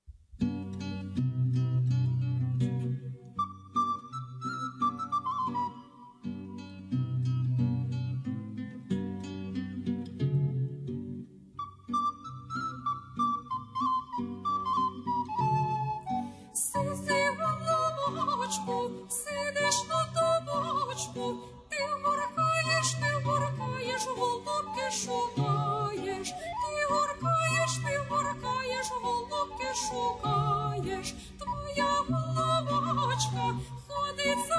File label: Baroque Ukrainian dance song Ukraine